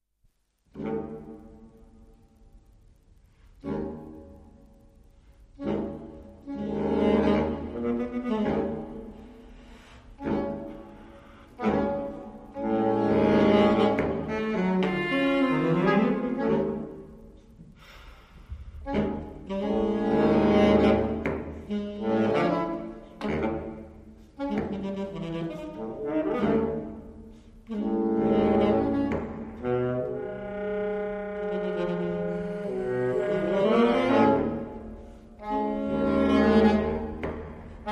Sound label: URBAN MUSIC